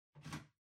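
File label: Ceiling Light Far Remote Control Holder Take Out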